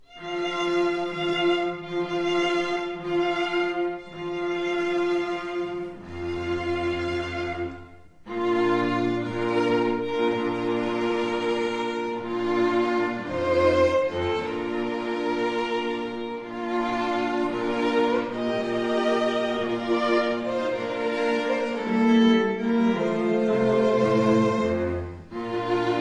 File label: wagner bridal chorus, strings